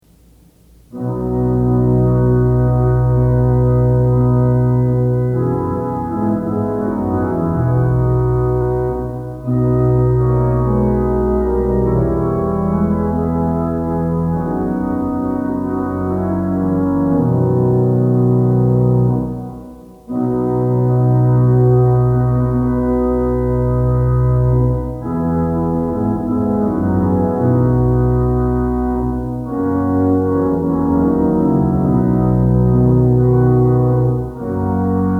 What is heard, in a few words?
Organ Music for Worship Services; O'er the Silent Waters; Twenty-Eight Liturgy Hymns